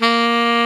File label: A#2, TENOR SAX; AKAI S-Series CD-ROM Sound Library VOL-7